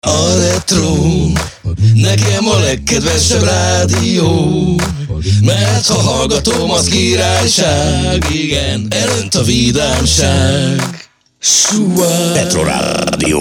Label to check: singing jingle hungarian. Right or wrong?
Right